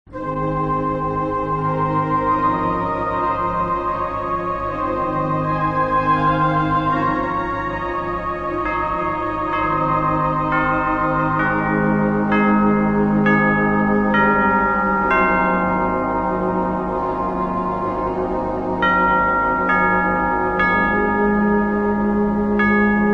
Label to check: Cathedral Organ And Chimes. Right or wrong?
Right